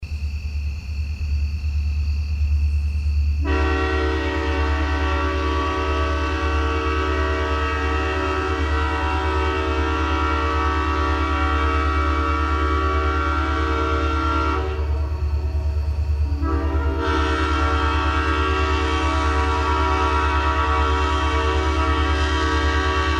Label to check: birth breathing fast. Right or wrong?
Wrong